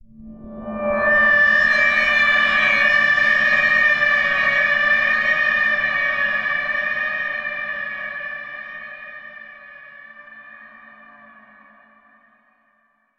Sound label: Jungle Danger; Far Cry